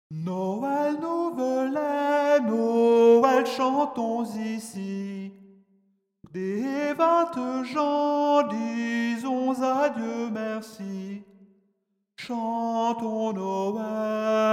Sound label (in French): noel tenor